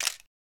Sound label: camera click; Huawei G8